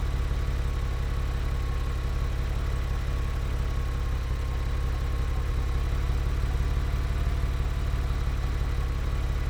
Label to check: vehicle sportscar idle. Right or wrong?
Right